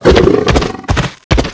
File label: Tiger Move